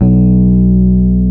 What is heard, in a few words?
EPF B 0; AKAI S-Series CD-ROM Sound Library VOL-2